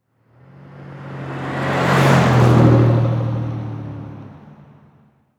Transportation Car Monte Carlo Drive By Slow

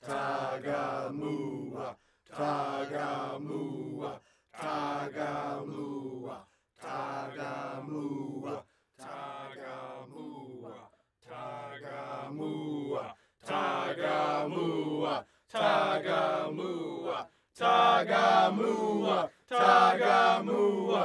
Human Crowd Chanting Tribal

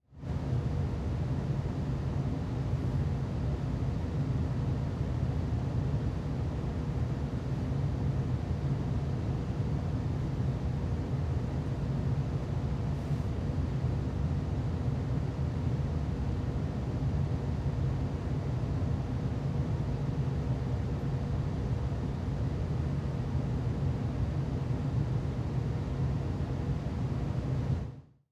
Furnace Run; household

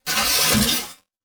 Transition Metallic Drone; Production Element

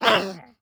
Animal Mammal Carnivore Dog Maltese Bark; animals; AdobeCC

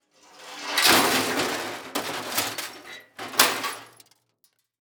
Impact Sliding Crash

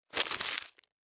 Household Paper Crumble Paper Fast

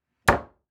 Household Cabinet Close Door

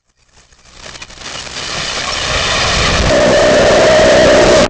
Title Transition Communication Distortion Jammer; Production Element Title Transition

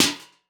Weapon Armor Hit; AdobeCC

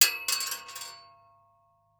Coin Dime Dropping On Metal Surface; Foley

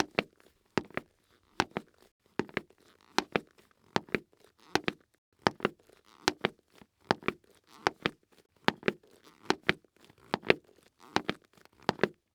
Foley Footstep Walk On Cement Cleats; Foley Footsteps

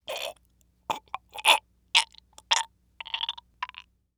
Human Male Being Choked

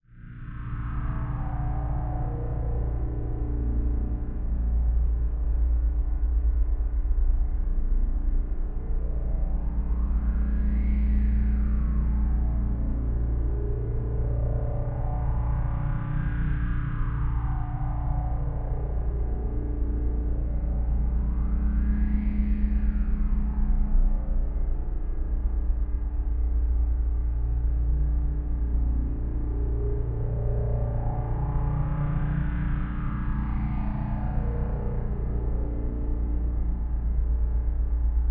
Drone Flange Square Wave Vortex Reverb; Production Element Imaging; AdobeCC

